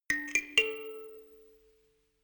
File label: notification kalimba rattle triad up major